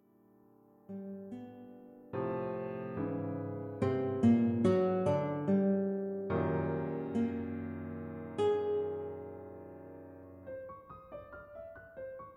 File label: Scherzo Gtr Pn